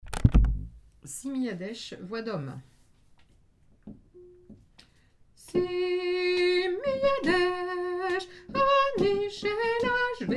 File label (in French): simi jadech hommes